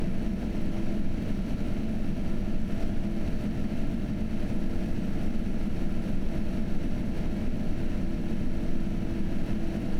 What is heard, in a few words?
Max RPM